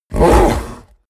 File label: fishman groan short; kreaturen